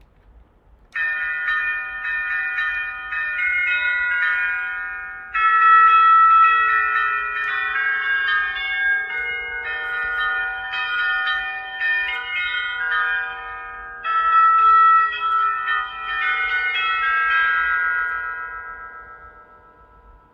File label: Chime